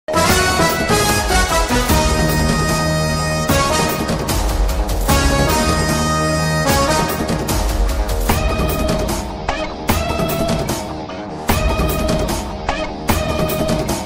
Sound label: mark antony bgm ringtone